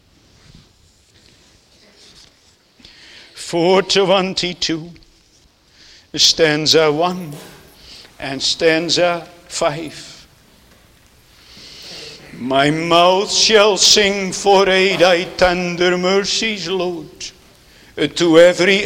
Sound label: Singing; Netherlands Reformed Congregation, St. Catharines, ON